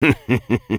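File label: laugh happy, French; Sniper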